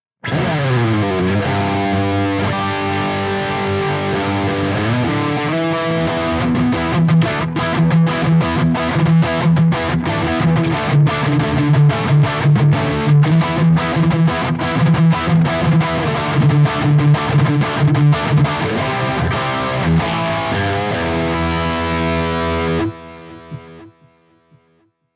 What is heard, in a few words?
Rhythm Lead